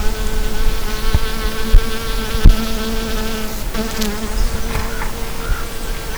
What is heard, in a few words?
Bee; Park